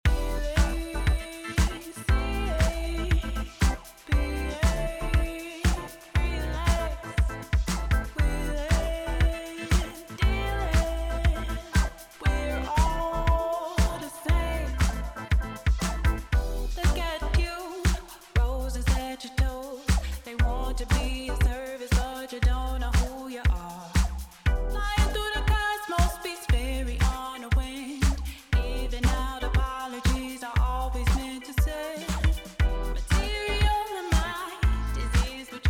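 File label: Multiband Compression Sidechain Vocals to Sub ON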